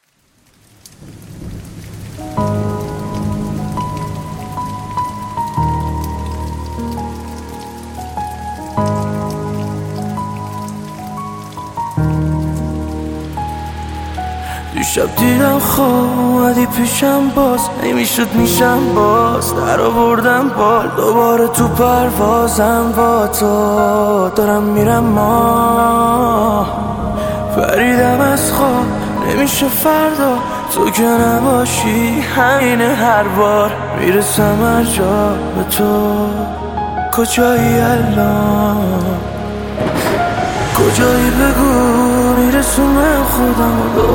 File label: Piano Version